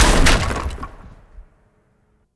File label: Boards Break